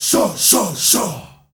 SHAWSHAW R, GRP CHANT, Heart of Africa; Spectrasonics; Heart of Africa 1

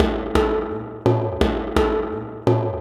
Metal Tank Talking Drum, processed; Sonic Foundry (Sony Creative Software)

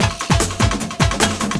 LOOP, 150 BPM; Ueberschall; Drum & Bass Flash Trackz

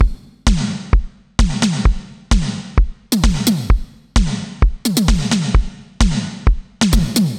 Beat Ninja Star; Zero-G; Future Wave; Beats

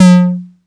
808, CONGA, Electronic; Wizoo - Platinum 24 Electronic; CONGA MENU

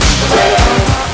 HIT 1 LOOP; Big Beat Samples & More; AKAI; ALL LOOP KIT

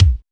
ugh kick; Drums In Your FACE!; NEWJACK

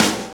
Ugly Acoustic, Snares; Drums

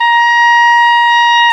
OBOE, Orchestral Winds; mf f; Roland LCDP04; Oboe 2&3

